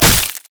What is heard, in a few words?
spider basic attack; Elise